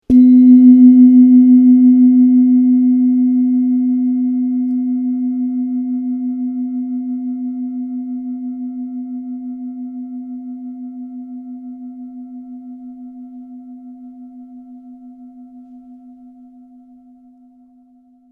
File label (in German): klangschale sonne